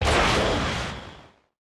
rocket turret launcher; Factorio

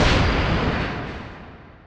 asteroid damage metallic huge; Factorio

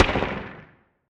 asteroid damage carbonic small; Factorio

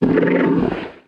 stomper idle, enemies; Factorio

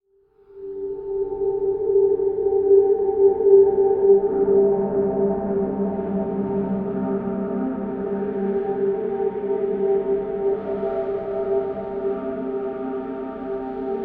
space, ambient; Factorio - Space Age

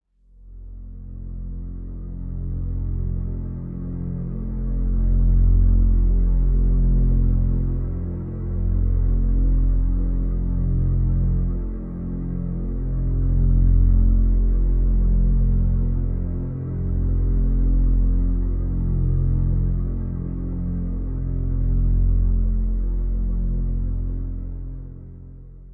ambient, gleba; Factorio - Space Age

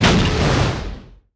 space platform explosion; Factorio

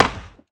axe mining tree; yumako; Factorio

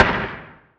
asteroid damage oxide small; Factorio

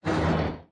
recycler jaw move; Factorio

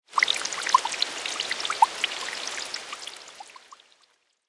rain on water; factorio

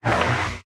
arm extend, asteroid collector; Factorio

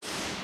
spawner respirator push; Factorio

